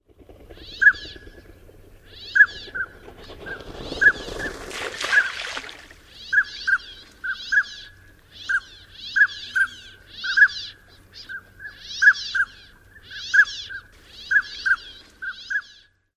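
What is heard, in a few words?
Northern Pintail, male buzz-peeps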